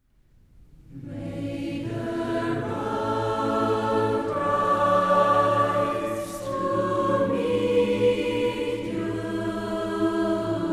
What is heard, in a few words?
Irish cara musica